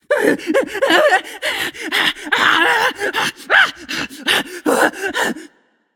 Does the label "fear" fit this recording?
Yes